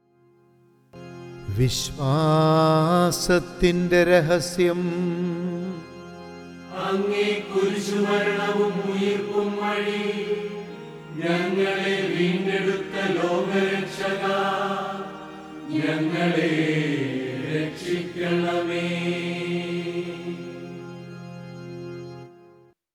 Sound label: Chant; ordo cantus